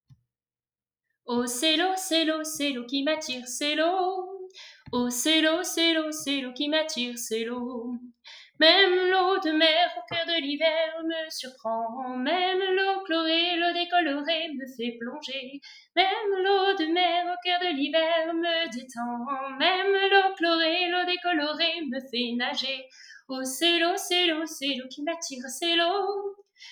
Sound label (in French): voix 2